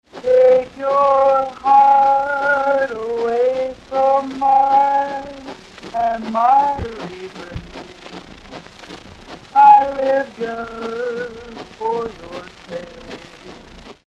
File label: Restored Man Singing